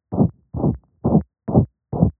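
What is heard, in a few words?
Dog pulmonic stenosis; herzgeraeusche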